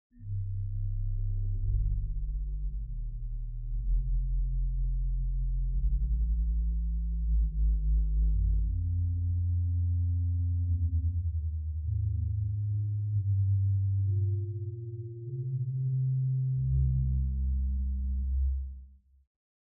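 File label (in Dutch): subbas 16, orgel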